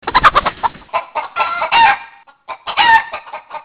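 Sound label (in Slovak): sliepka, hen; zvierata; domace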